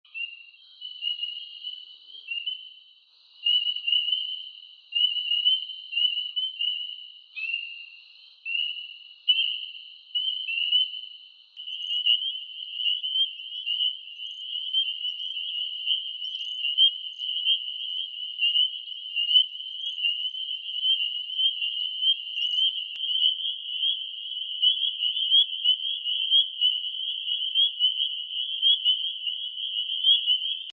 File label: Spring Peeper, frogs